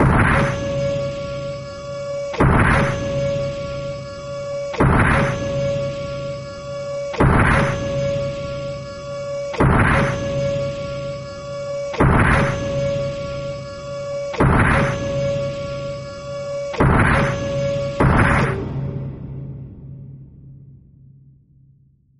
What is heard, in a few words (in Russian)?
zvuk robota